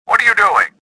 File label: anger; Star Wars Jedi Knight II Jedi Outcast; XBOX